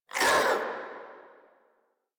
Infested Charger Pain; Warframe

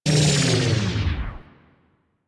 Ring Rotate Large Slow Stop; Ambience; Interior; Warframe; Orokin

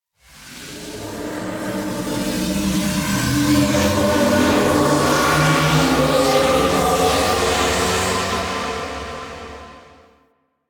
Exterior Cloud Probe, Ambience; Warframe; Rail Jack

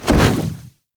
Wall Latch Start, Foley; Warframe; Tenno

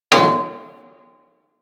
Bullet Impact Debris Pipe

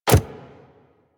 Step Default, Foley Footsteps, Pets; Warframe; Zanuka; Zanuka Companion A

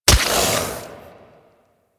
Tendrils Embed Hit A; Warframe; Warframes

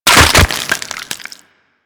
Chest Open; Warframe; Infested; Diseased Ancient; Attacks

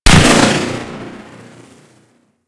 Space Drone Bomb Explode, weapons; Grn Space Drone

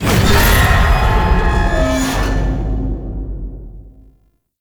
Floor Complete Stinger; Tau; Tau Circles Of Hell

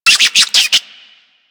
Infested Minion Bark, Vocals; Warframe

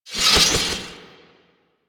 Spear Gun Whizzby; Weapons; Warframe; Tenno; Hoplite

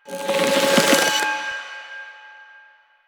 Choir Bow Charge; Warframe; Weapons

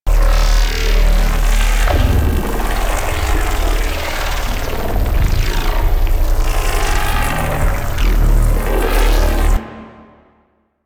Sentient Ram Sled Breach Loop; Warframe; DE; Vehicles; RailJack; RailJackEnemyShips; Sentient Ramsled Agent

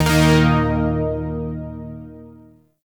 Shawzin Chromatic Chord; Zariman Shawzin

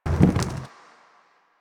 Pylon Plastic Roll

